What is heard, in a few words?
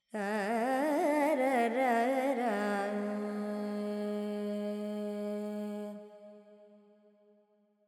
FX Lydian, Vocals, Carnatic Female, Alaps